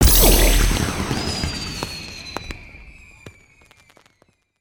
Celebrate; foley

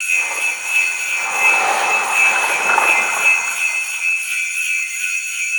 Lantern Ice; Ninjago

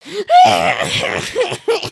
cry

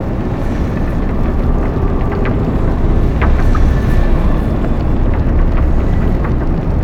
Maelstrom Drill

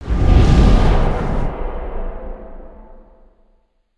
Wind Leap, ambience spaceship; Starbase

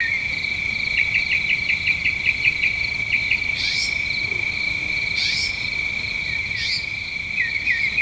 night bird loop; nimbus station

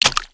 paint splats; build mode

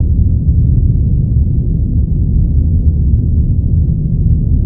Engine Corner, ambience spaceship

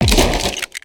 Crates n Boxes, destructibles

